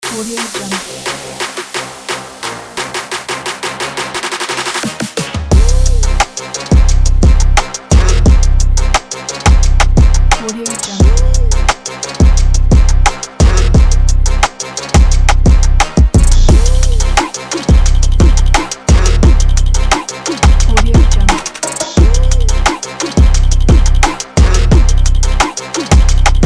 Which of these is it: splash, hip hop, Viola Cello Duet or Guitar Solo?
hip hop